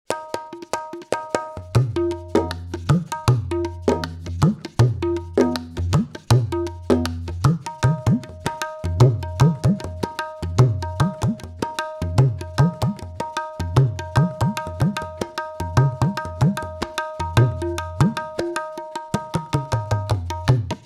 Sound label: Tabla Solo